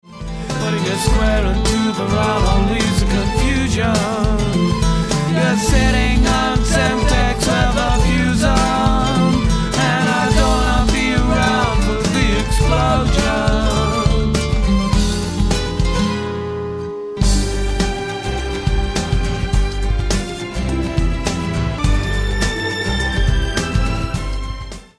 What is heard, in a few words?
Whistle Song